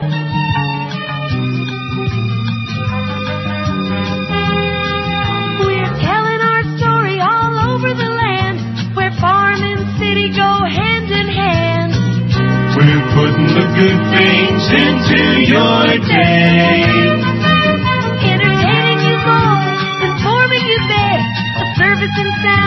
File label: long info radio jingle